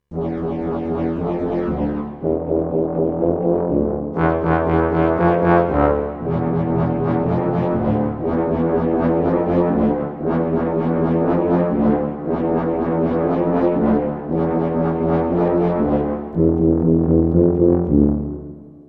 M-OC1 pulse brass

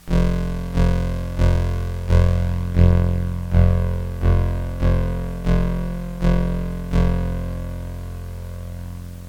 Neutron VCF

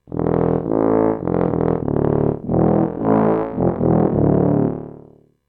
low brass

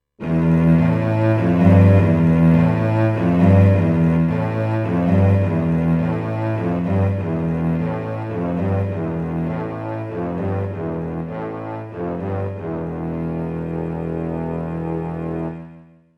blend

